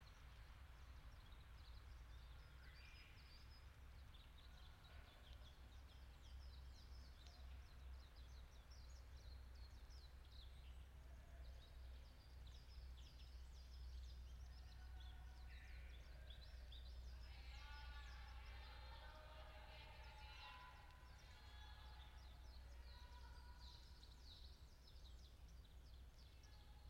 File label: yamanakako sound